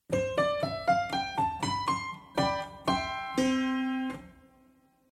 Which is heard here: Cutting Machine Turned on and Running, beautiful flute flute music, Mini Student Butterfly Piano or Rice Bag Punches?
Mini Student Butterfly Piano